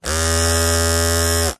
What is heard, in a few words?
household razor clippers hair electric on buzz off static short; zapsplat